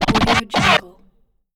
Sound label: record scratching